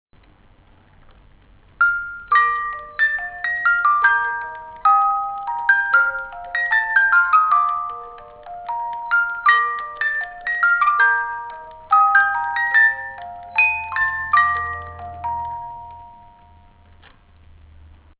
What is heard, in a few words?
music box; Internationale